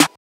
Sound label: Snare; FL Studio; young kico drumkit